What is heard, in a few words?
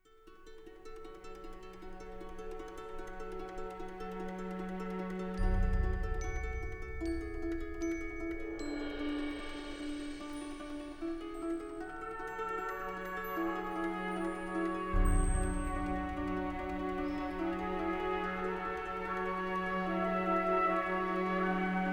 Orchestra; Mach1Spatial